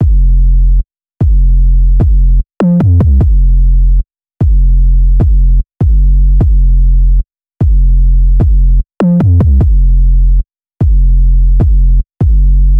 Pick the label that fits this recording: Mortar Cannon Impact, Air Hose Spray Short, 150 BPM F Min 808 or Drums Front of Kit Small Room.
150 BPM F Min 808